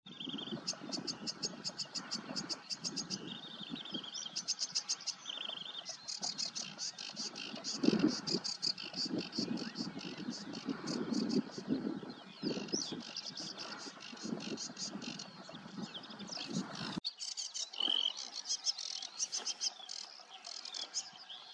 rokitniczka

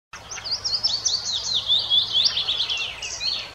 piecuszek, ptaki

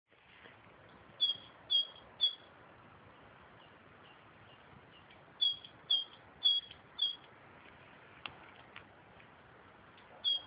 bogatka, ptaki; sikorka

